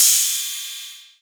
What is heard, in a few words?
Rides, Cymbals